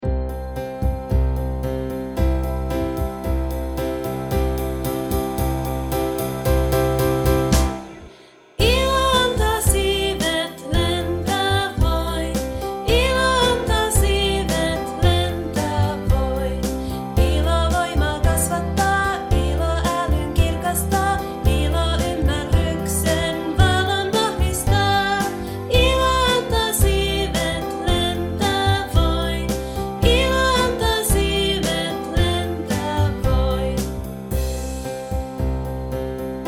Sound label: Song, FI